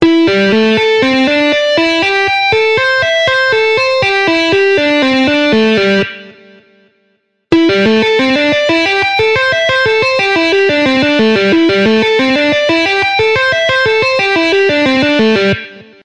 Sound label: tapping sound, guitar